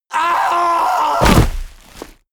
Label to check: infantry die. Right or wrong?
Right